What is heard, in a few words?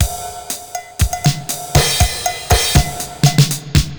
Spring Verb Compressed TR 505 120BPM; musicradar; cyborg beats